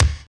KIK, Drums; AMG; Now CD-ROM (Roland)

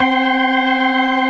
STOPS, ORGAN

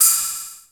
sfx perc

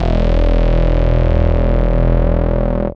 DR. LOOP, BASS; X-Static Goldmine 3

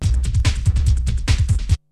BREAKBEATS, TECHNO

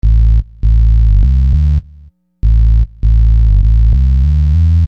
Big Buzz Bass, Hip-Hop; Construction Kit; Sonic Foundry (Sony Creative Software)

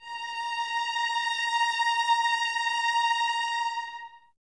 A#5, 11 Violins, Soft; Miroslav Vitous

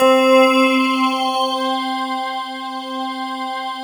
World of Synthesizers, SYNBELL

